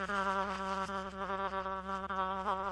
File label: bee; mobs